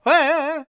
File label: G# MIN, World Voices, LAKOTA